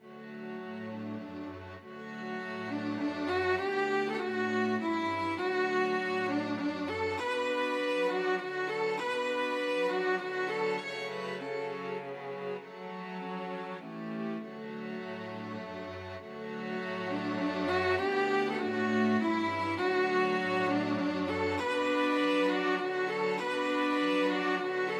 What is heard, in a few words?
waltz STRQ, string quartet; brahms